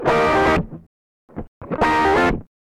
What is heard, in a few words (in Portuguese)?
stabbs, loops guitarra; loopasonic